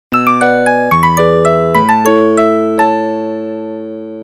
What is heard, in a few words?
Original Nokia Tune